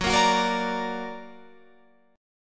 Gb Augmented Guitar Open F; 18,17,18,17,17,17; Gb+ strummed

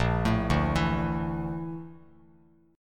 A sharp Major Sharp 11th, down, Bass Standard; arpeggiated; JGuitar

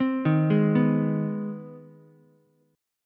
C Minor Major 7th Sharp 5th, Ukulele chord; arpeggiated; JGuitar

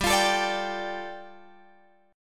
G 7th Suspended 2nd, Guitar Drop D

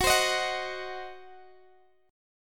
Eb Minor 6th Gb, guitar chord

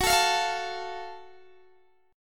Gb Minor Major 7th Gb down Guitar DADGAD

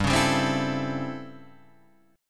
A 7th Sharp 9th

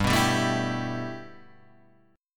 G 6th Add 9th G Guitar Standard, chord; G6add9 strummed; capo=2

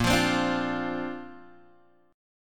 A sharp Major Add 9th, Guitar, Open G

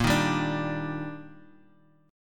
D sharp Major 7th A sharp

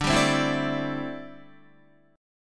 D 9th Suspended 4th D down Guitar; D9sus4 strummed

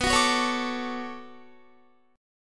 B-Minor 9th B down Guitar; Bm9 strummed

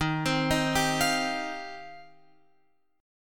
Eb 6th Add 9th, Guitar, Open F; arpeggiated; x,10,12,10,10,12

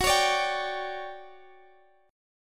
D Major 11th Gb, chord

